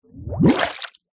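nature water bubbles medium large rise to surface water; zapsplat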